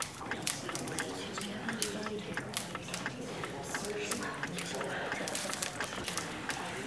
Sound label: song; redden